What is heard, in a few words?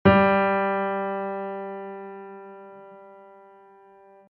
Note G